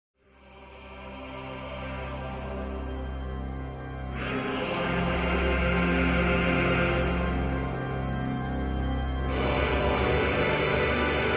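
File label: background music; Ninja: The Chakra Awakens; Android game music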